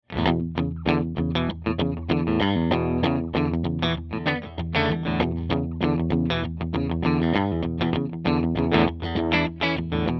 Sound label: AC30HW2 Normal Bright, amp